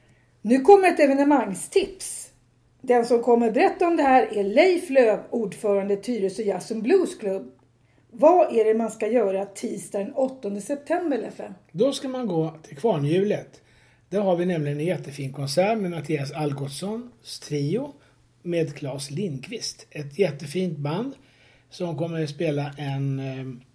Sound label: jazz